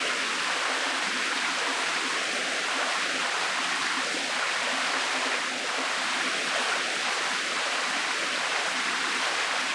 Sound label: Hoverboard Loop Water; Subway Surfers City; Android, iOS